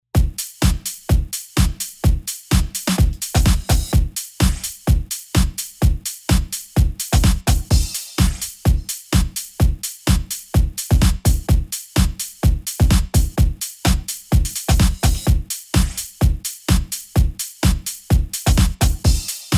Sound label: Sampled Drums